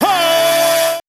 "ho"; Voice; ooh ahh

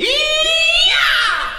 eeeyah male, voice; ooh ahh

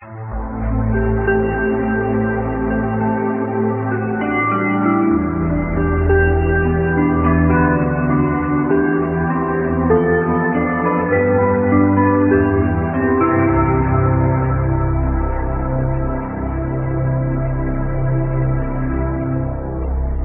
guitar, Sad

